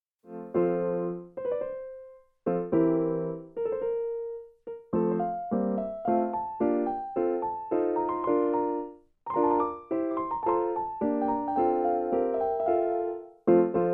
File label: computer expected